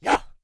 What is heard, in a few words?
horseman attack; propack